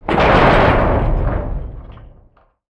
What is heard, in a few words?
Collision, Explosion; NanoFXv2; ExplosionFX; ExpCollision